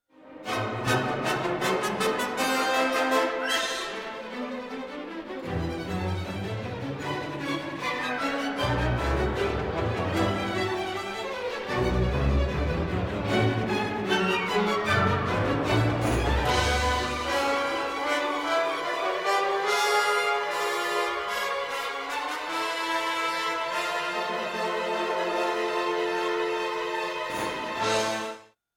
symphony

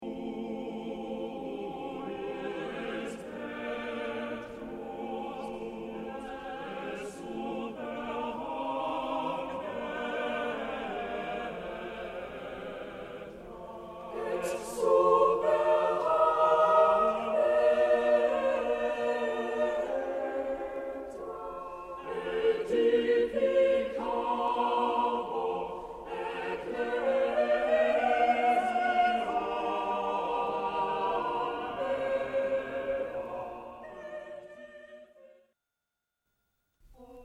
motet

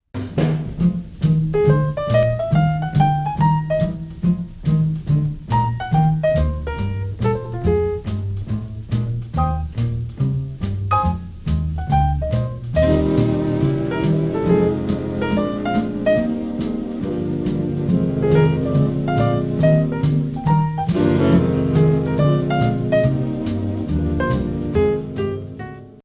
improvisation

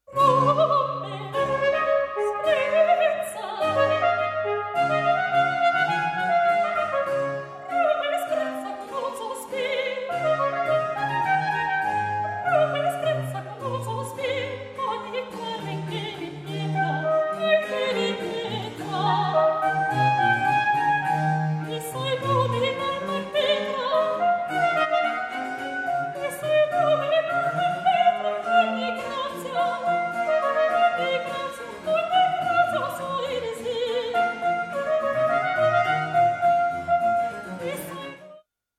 obbligato

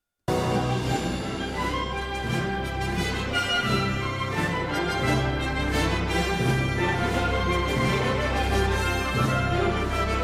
canon

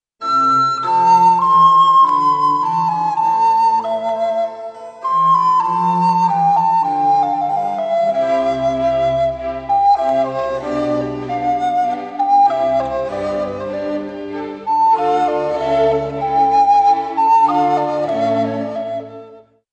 recorder

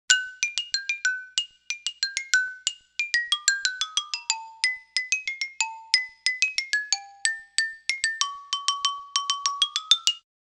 xylophone

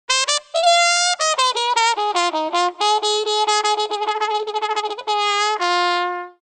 trumpet muted

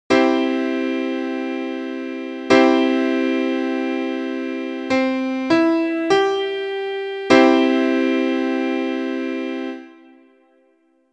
chord

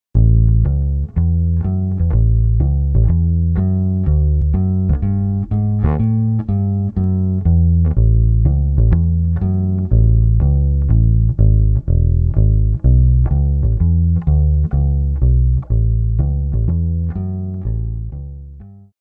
bass guitar

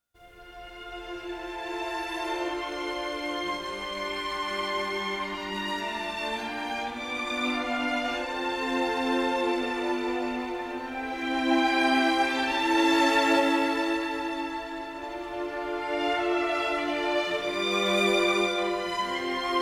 legato

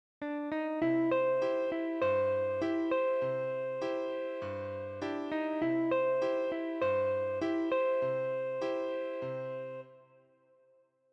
anacrusis

